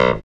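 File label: CLAVINET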